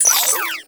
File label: Digital Glitch; Corpus Railjack